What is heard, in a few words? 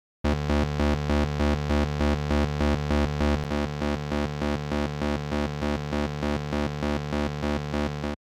Moog High Pass Filter Off On; Warm Audio TB12